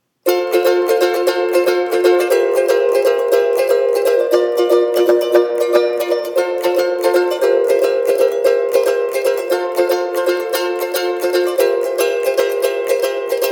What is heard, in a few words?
uke